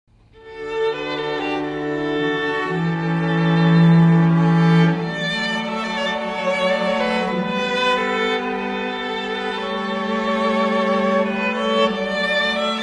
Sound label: oboe